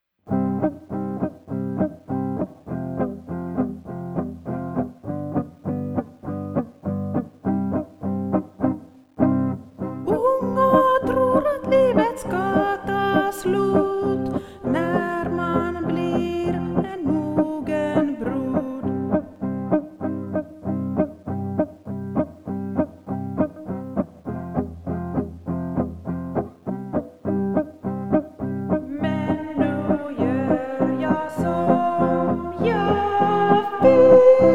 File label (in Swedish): sopran